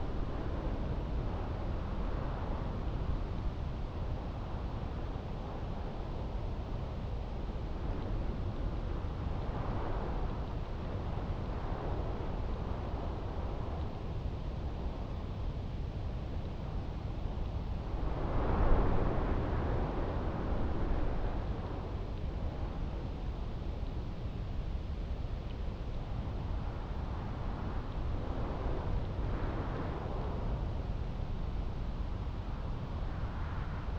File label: Ambient Windy Mountains